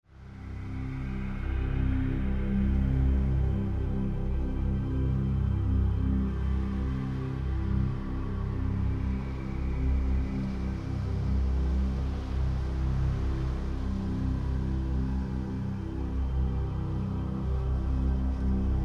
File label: Scientific Sounds Music for Learning Memory Boost